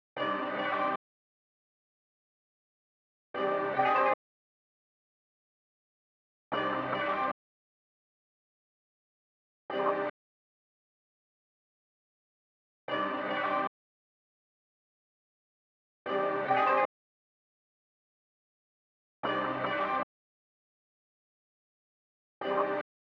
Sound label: 151 BPM C Min Synth Chop; Melody Loops; Loop Stems; Cymatics; Jungle